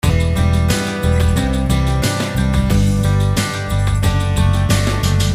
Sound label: guitars Loop